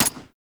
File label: Assault Rifle Mech; weapons; Warframe; Grineer